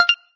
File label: button sound; didj